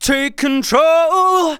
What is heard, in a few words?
vocals sung F#; Techno Trance Essentials